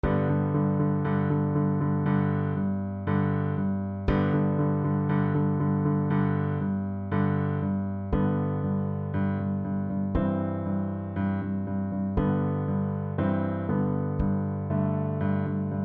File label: anthem string orchestra faster